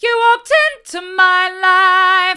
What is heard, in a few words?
hard, vocal